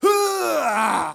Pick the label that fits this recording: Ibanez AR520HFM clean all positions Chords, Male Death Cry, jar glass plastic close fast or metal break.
Male Death Cry